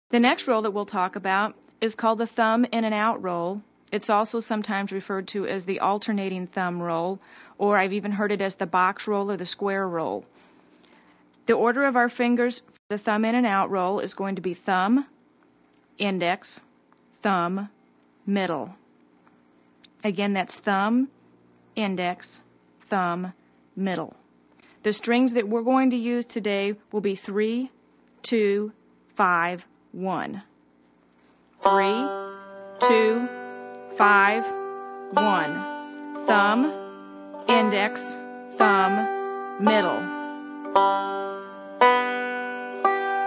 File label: banjo roll